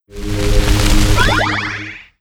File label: Skill Fire casting; FlyForInsanity